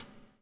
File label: BUBL, BUBBLE; Spectrasonics - Backbeat